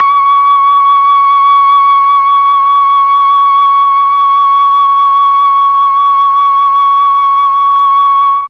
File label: C#4 TRON FLUTE, Mellotron; Mike Pinder - Mellotron Archive CD